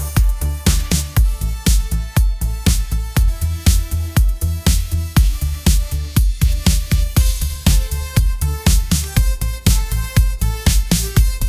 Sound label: bright pop ringtone groove; ai